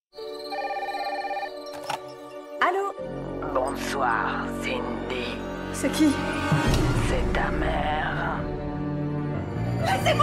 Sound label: scream; fervex